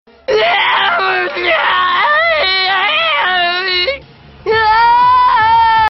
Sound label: scream